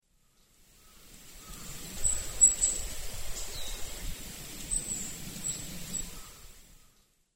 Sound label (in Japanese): mamijiro, sakata, koe